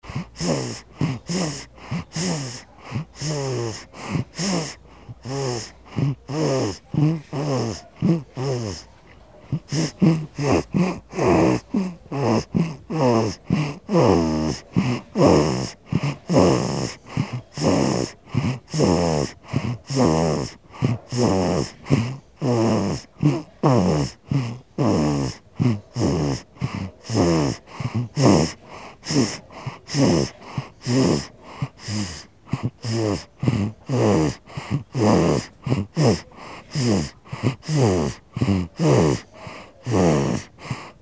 newborn baby snoring looping

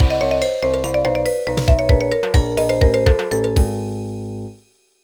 Boss Lose; mario vs. donkey kong mini land mayhem

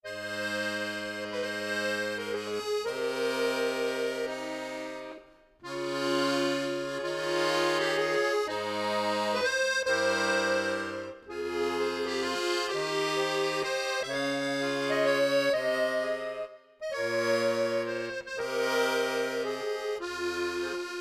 Accordion

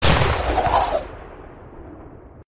spider cannon sound; Arc of Templar

